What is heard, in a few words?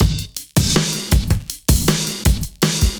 CB LOOP; Zero-G; Chemical Beats